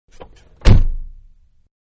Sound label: Car Big-Door Close-Interior; Cars (Sound Effects:Vehicles); Manhattan Production Music